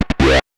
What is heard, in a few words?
FX shot, Drum+Bass, 160; eJay